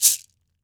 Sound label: CABASA GOLD; Brazil